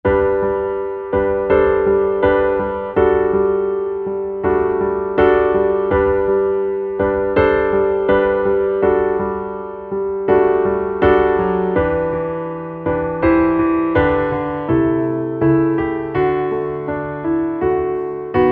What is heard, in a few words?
Underscore PIANO; Worship Flow